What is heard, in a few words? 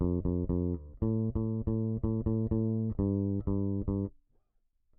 Bass Chorus